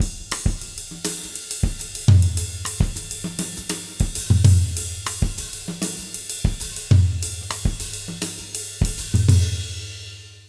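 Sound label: 100 Mozambique, jazz latin drumloops; USB Soundscan vol.08; AKAI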